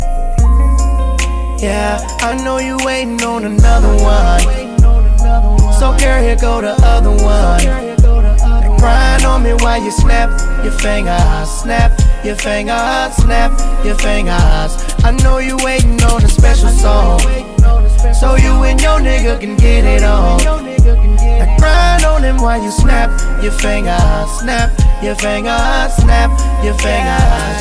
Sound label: snap, hip hop ringtone